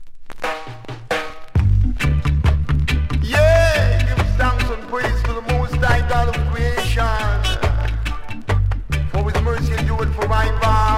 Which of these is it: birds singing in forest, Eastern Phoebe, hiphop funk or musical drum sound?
musical drum sound